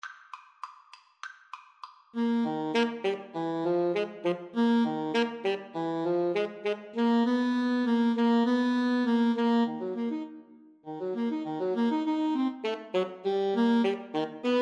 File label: ASAX; Alto Saxophone Duet